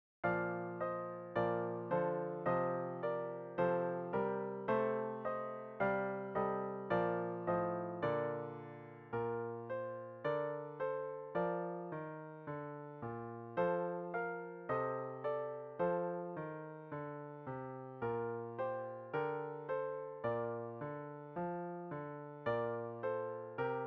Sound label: Solo Two; for all instruments except Eb Brass, Eb Clarinet &, F Horn